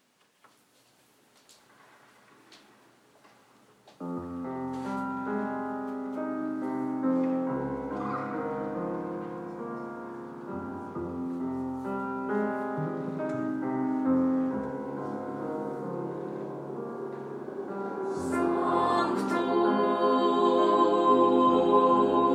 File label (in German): Bass, Sanctus; Messe; Chor